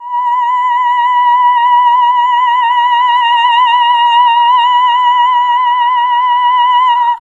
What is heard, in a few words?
FEM; Sound & Vision - Gigapack II CD 1; CL.FEM.SOLO2